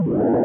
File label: test recording, Magnetic Card Data; Magnetic Card Data Decoder